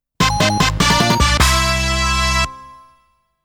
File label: Stretch The Back (Win)